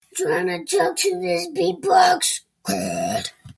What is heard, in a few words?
beatbox